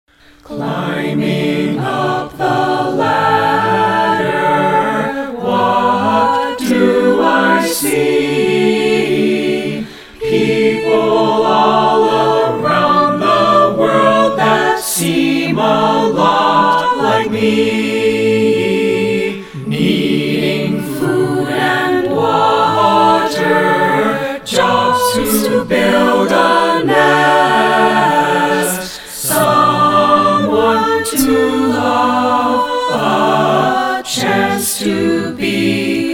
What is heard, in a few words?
PEACE CHOIR; Climbing Up The Ladder